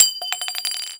grenade launcher casing bounce

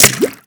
bullet water; weapons; Modern Warfare

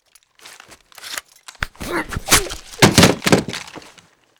knife melee; Modern Warfare

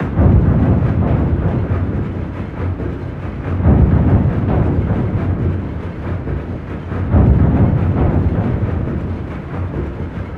ship engines; World Of Warships

